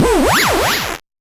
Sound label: Noise A, 8-bit, Bitty Noises